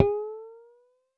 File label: U220 FX Set, Drum Machines; Roland U220